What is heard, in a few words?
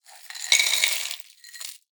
pour crushed ice into ceramic bowl; esmteam